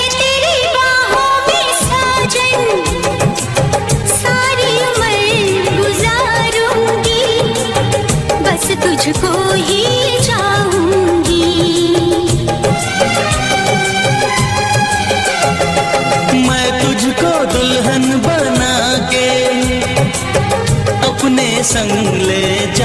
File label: Song Ringtone